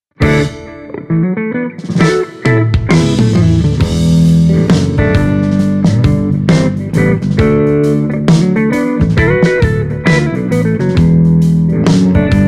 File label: Celestion G12T 75 Les Paul